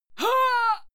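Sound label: female fight pain, screams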